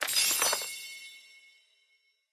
Ice Winter Lord Gems Shield

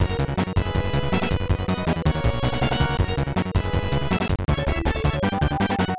Player Win

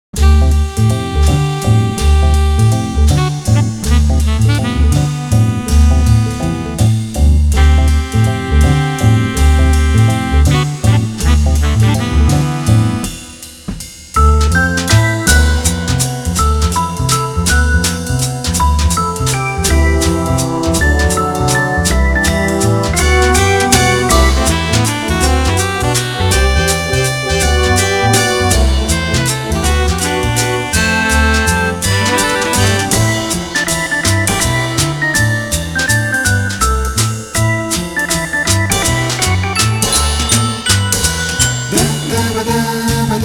Lounge; pop n music 2; dreamcast

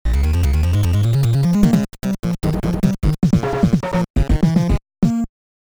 Final Course Clear

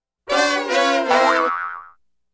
Heartbroken (Jingle); genso suikoden original game soundtrack

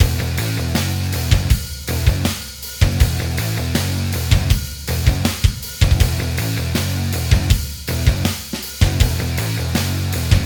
3d Music

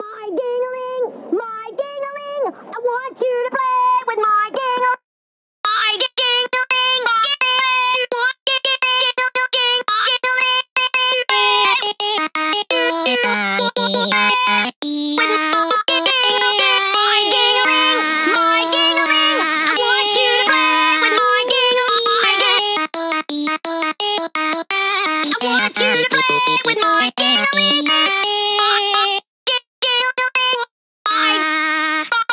Voices; FNF

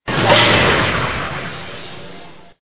Aircraft glide

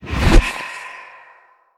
Shadow Shift Hit; we are the dwarves gamerip 2016

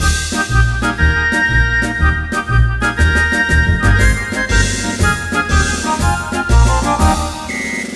Deploying the Gnomes [Jingle]; Drihoo

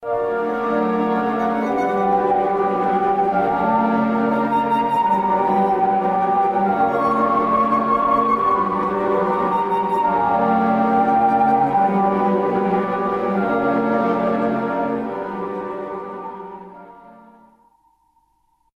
Alien Music; There Is No Game: Wrong Dimension

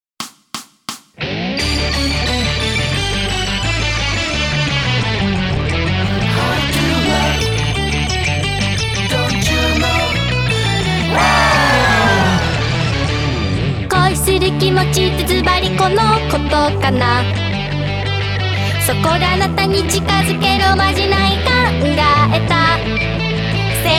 Drum Minus Track

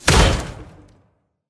Demonboss Hitdoor; Level16

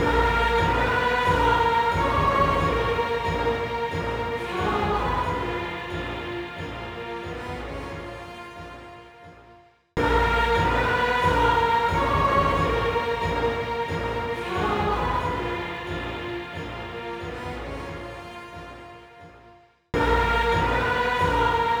Bossbattle Fade; Might and Magic Clash of Heroes

